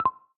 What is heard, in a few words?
Video Stop, ui; Android P; Ringtones